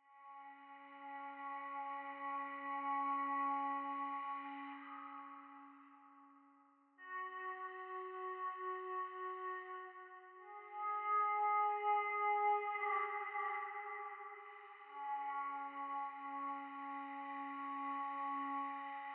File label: Pads and Toy Flute Mood; gamerip; The Last of Us